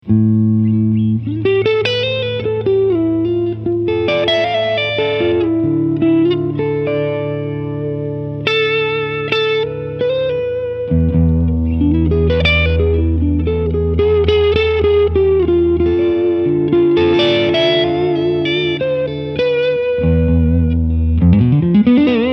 40 Watt Modus Reverb; Mesa Boogie California Tweed

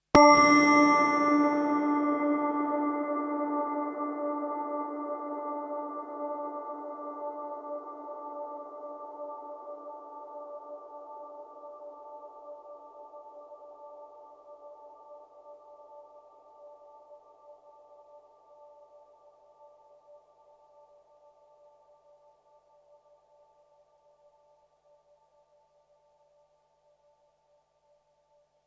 Strymon Big Sky 20 Sek Hall Digi Synth

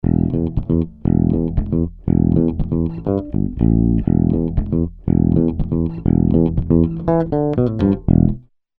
Tube Tech HLT 2A E Bass ohne HLT 2A

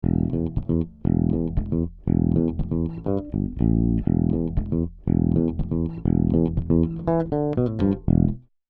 Summit Audio TLA 100A Bass ohne TLA 100A

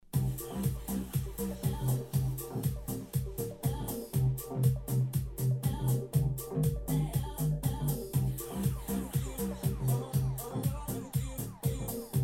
Musik Mic Gain Low, Roland R-05

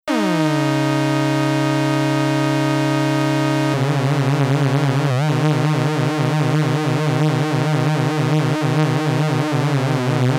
iSEM OSC Mod per LFO Sync an aus

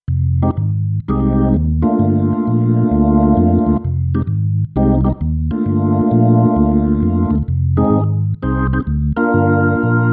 Korg Grandstage Orgel Smooth Jazz

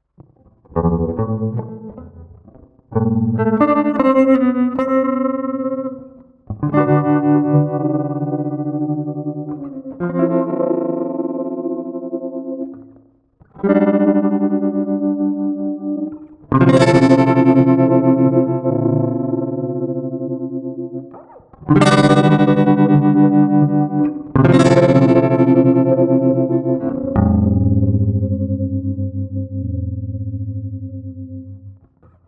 Moog Moogerfooger Plug Ins Gitarre

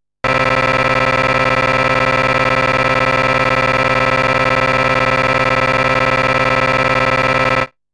Granular synthese Retrigger; Emu EIV classic und Ultra; Stage 1

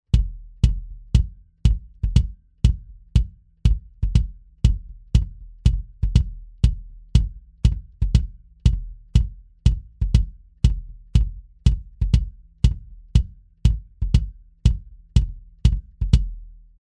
V Kick Mitte Modern; sE Electronics

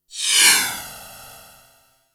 Detune kurz

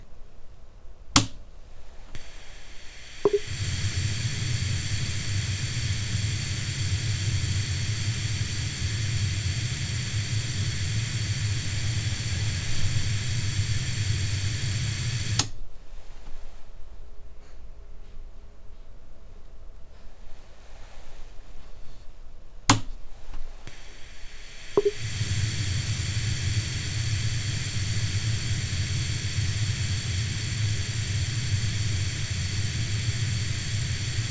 thebox pro 110 BP Rauschen 10cm Abstand

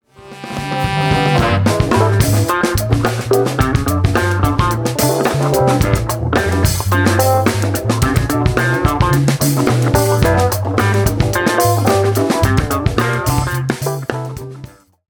mit Gitarre; Steinberg SpectraLayers Pro 10

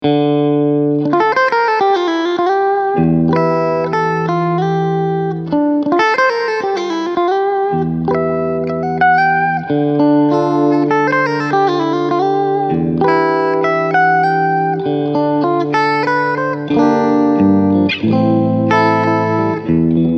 Steg Mitte Picking

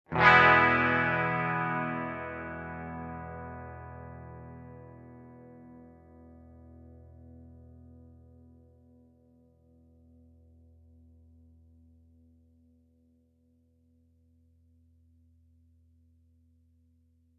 Sustainvergleich Cort Ibanez; Cort Links; Ibanez Rechts

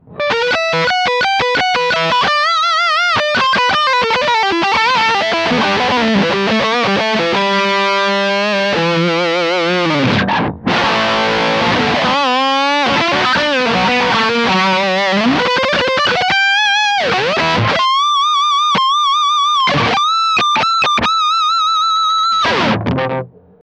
Soundvergleich Highgain Lead Cort X700

